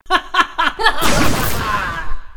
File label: Laugh Attack Yar Har Har; emotes